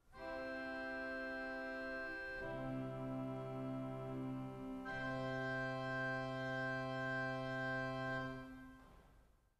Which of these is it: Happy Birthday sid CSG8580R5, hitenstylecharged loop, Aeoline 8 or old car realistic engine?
Aeoline 8